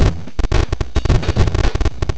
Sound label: drums